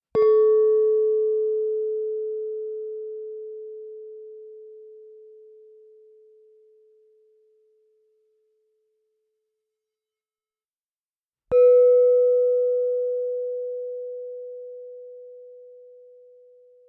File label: gend pan, gamelan; sounds of mtb